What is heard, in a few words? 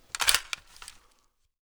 m16a2 mag in; garrysmod